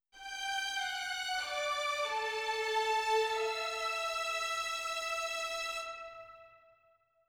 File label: violins melody; combat results win; Netease